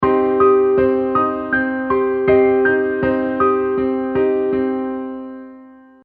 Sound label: Piano Version, toy melody; Toy Soldier